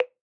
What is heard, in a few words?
Percussive Hit